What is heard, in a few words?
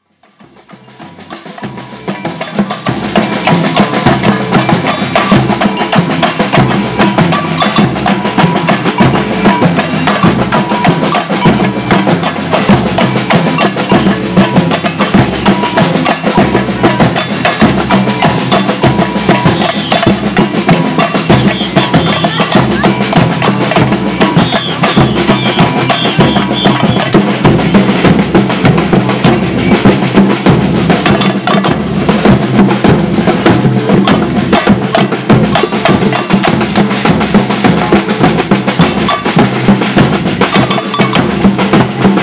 batuk